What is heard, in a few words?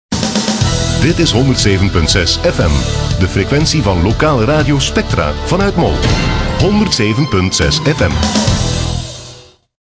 jingle; Spectra Mol